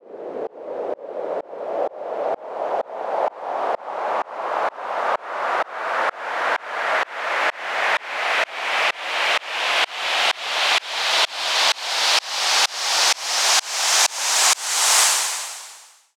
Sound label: Medium Sweep, White Noise